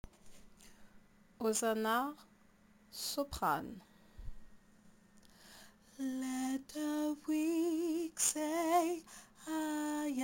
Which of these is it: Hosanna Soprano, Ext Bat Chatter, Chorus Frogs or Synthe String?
Hosanna Soprano